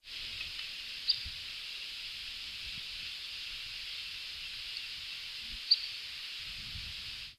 dormilona cenicienta

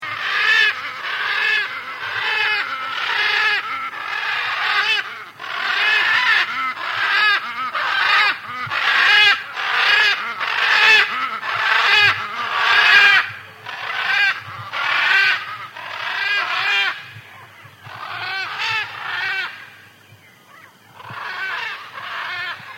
guacazulama, Guacamayo Azulamarillo, Ara ararauna, Blue-and-yellow Macaw; cantos

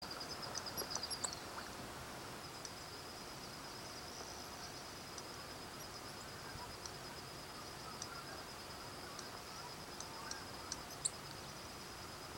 doradito cope

